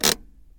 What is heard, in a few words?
emergency brake; car